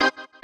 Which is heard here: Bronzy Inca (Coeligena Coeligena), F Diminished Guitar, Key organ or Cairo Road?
Key organ